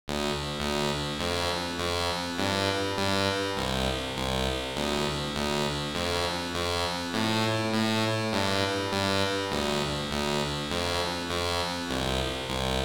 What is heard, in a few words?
music